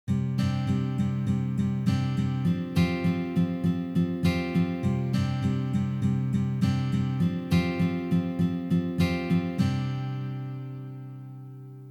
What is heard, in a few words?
Sus2, guitar